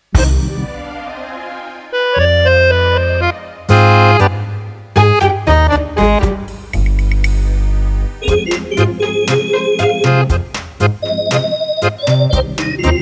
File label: Organ Tango